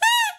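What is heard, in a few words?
Toy Squeak, Foley; cartoon; musicradar; cartoon caper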